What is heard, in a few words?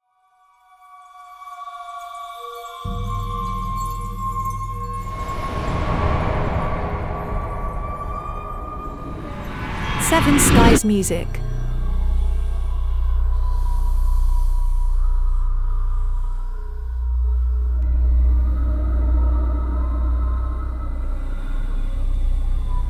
Dark Ambience